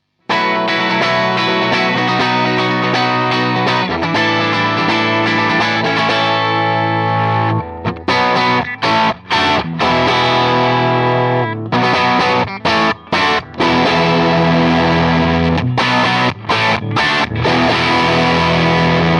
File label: JTM45 diode Tele